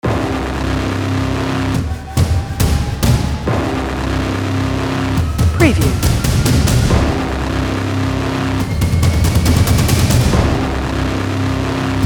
Thriller Trailer Horns Intro Cinematic Action Drums Trailer